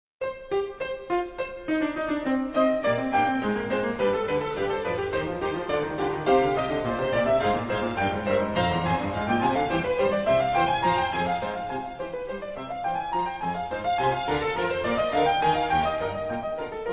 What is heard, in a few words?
Bach Piano Concertos